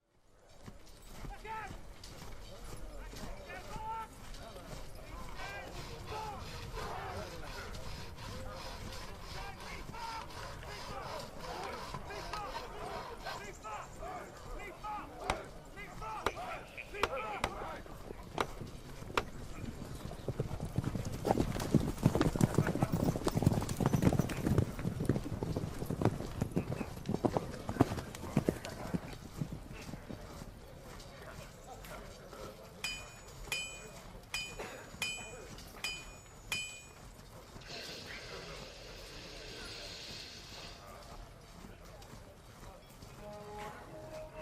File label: War Camp Ambience, Fantasy Ambience; RPG; DND; RPG Sound Compilation